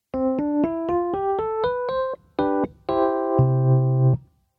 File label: Rhodes Classic